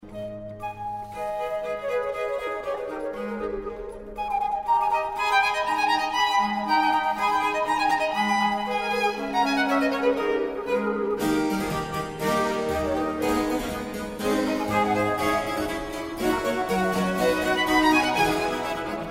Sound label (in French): quatuor sonata; sonata III oeuvre XV